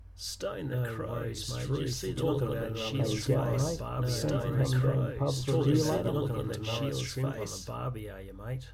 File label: crowd flat